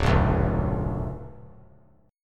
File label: D Minor Major 7th Sharp 5th, D; DmM7#5 strummed; JGuitar